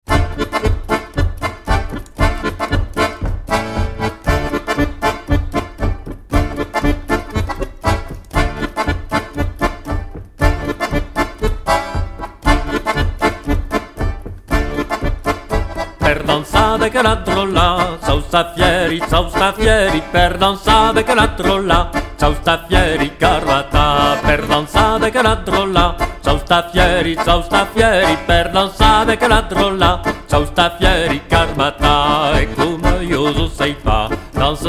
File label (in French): Polka; tradethik productions; Per dancar